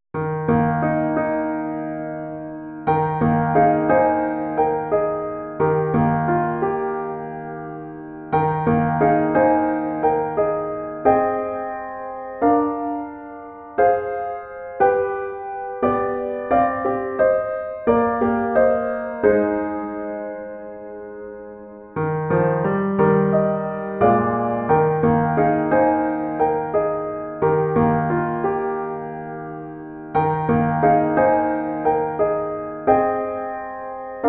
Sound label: piano only